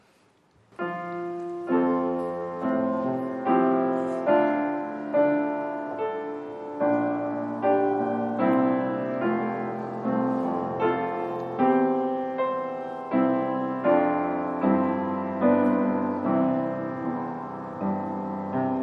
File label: piano; hymn training